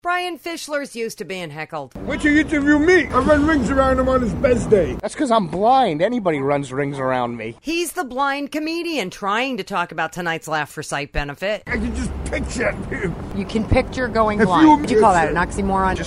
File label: laugh